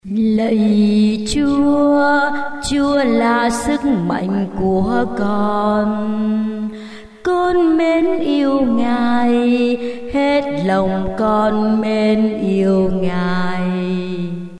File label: alto basso